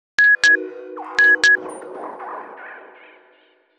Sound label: Message, Nokia Alerts; Windows Phone apps